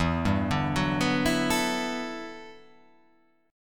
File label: E-Minor 11th, Em11 chord; arpeggiated; JGuitar